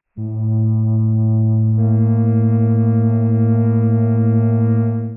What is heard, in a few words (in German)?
flöte; Intervalle, die man durch Potenzen von 2 und 3 darstellen kann; a b1